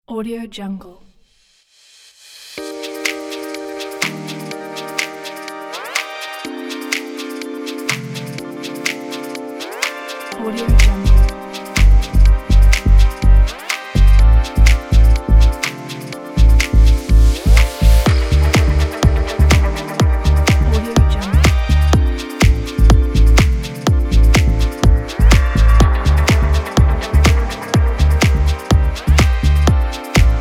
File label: stylish dance deep house